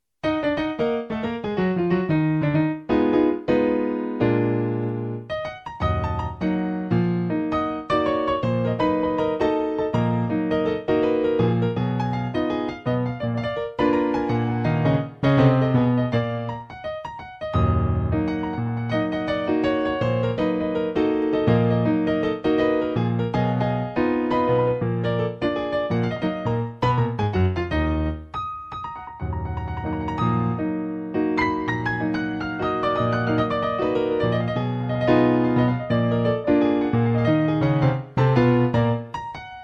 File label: Pianist; Swiss